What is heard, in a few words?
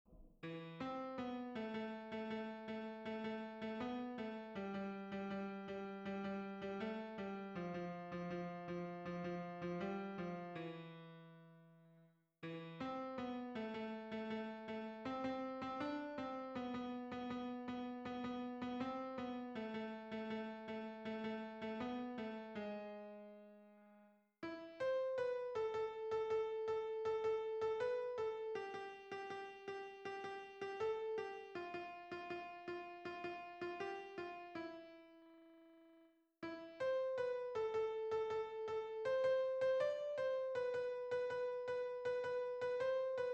rumba, فلامنکو